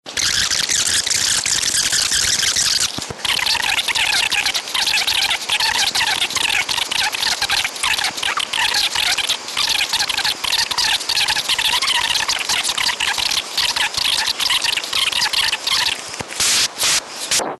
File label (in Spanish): camara rapida